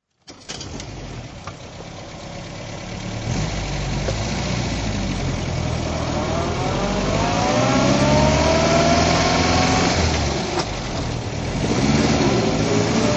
mgtc drive

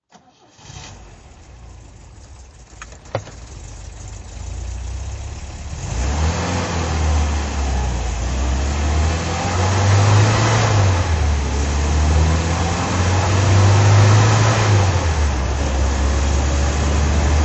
MGB road drive

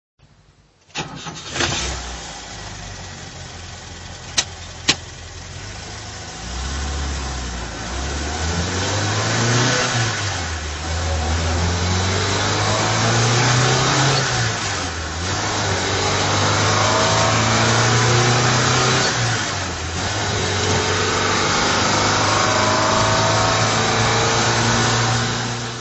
volvo p544 drive

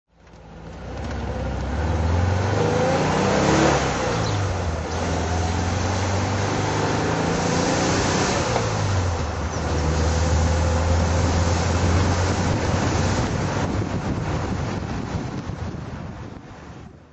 vw1303 drive

